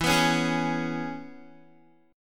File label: E 6th, down, Guitar; strummed